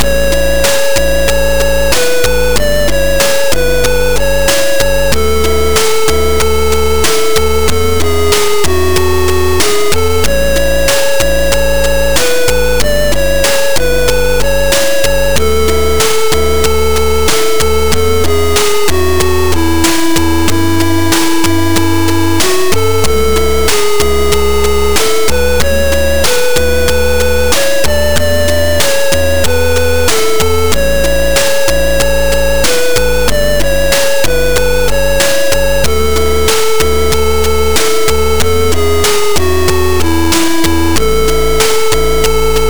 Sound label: Hi Score, C64; Commando; CNGSoft